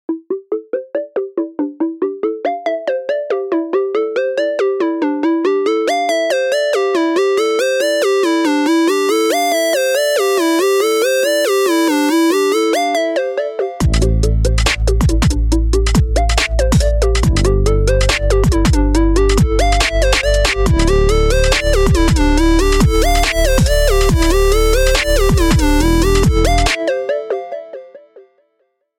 Happy Arp Ringtone

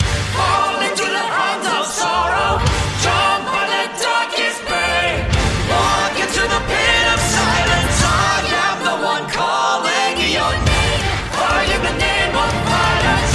Chorus

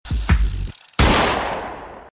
Gun Shot

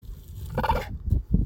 Cheetah Stutter Call

